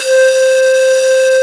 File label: pan pipe C5; Big Fish Audio; Synth City